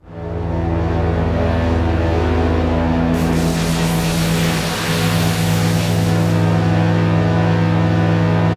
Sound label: Scary, nightmares; Dream Zone